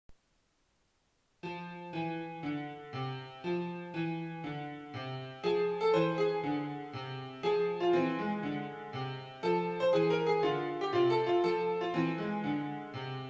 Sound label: piano bar